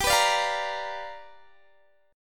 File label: G 7th Sharp 9th G chord, Guitar Open D